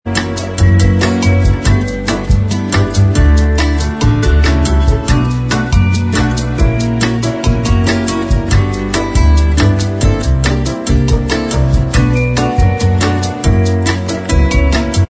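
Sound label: bossa version instrumental Track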